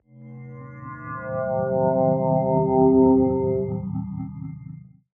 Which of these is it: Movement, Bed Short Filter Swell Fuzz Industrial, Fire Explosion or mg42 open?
Movement